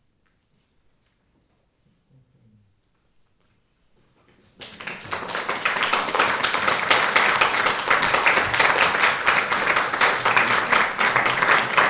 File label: Applause; Liederkreis and Wolf 2017